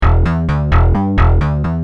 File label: Rage, Bass; Industrial